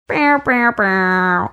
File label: making sad air horn sounds